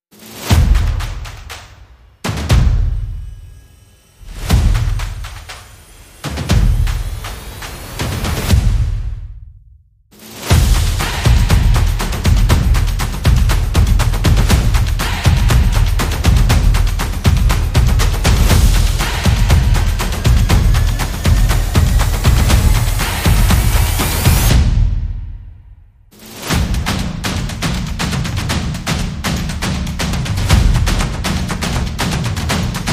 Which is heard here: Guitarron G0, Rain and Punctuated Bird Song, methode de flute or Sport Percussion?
Sport Percussion